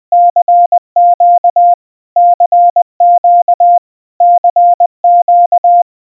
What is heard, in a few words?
CQ CQ CQ, Морзе; Вызов всех станций; Генератор азбуки Морзе